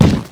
nutcracker step; lethalcompany; zerosiki; slender

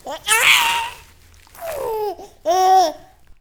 baby talk; tm baby; slender

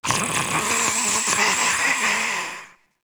gorefast idle; kf2; gorefiend; slender

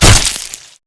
thumper despawn; lethalcompany; zerosiki; slender

